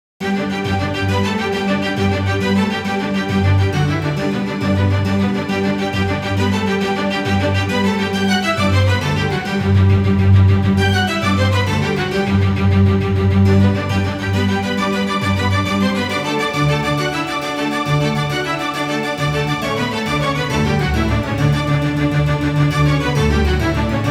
Concerto Alla Rustica In G Major, Classical Masterpieces; Vivaldi